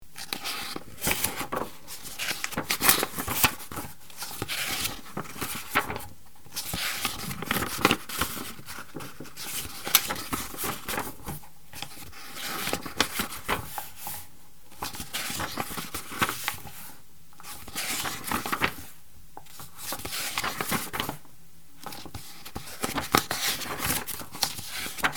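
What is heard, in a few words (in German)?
buchseite umblättern; haushaltsgerausche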